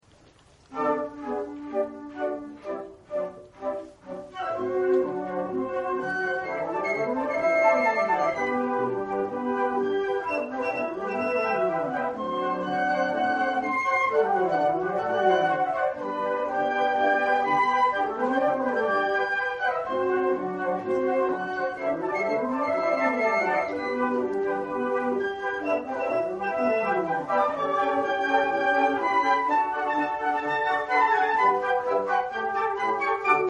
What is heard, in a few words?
concert, flute; Newyear concert 2015